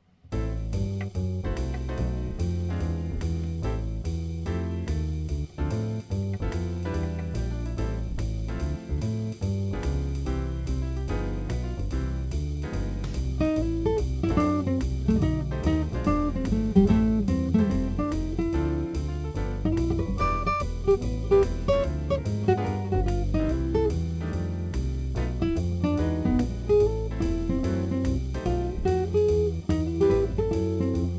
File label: lullaby, Jazz Guitar